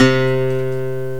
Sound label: Steel-str. Gt, Guitar; GMPlay